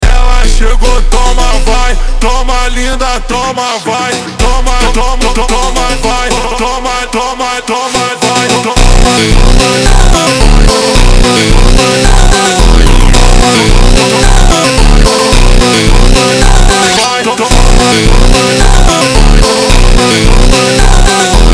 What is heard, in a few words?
Phonk MONTAGEM Super Slowed